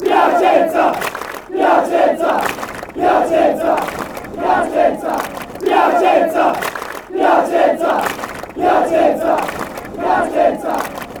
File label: fanchants; piacenza